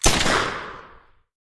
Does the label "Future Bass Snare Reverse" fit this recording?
No